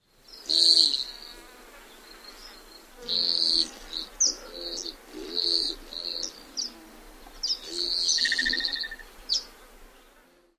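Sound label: flight display, Black-chinned Hummingbird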